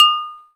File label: glass